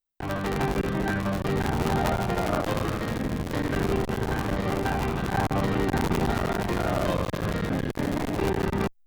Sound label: piano bug chrome